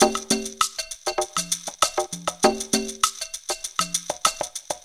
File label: PERC LP, PERC LOOPS; Zero-G; Funky Elements